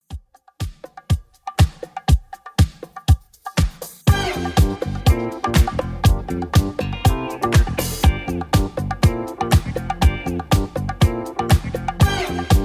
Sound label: 80s Redrum 121bpm; remixplanet